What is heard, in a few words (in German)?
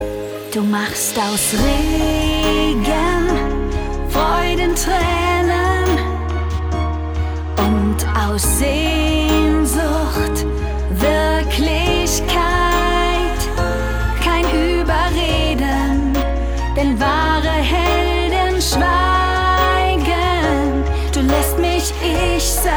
Gesang Song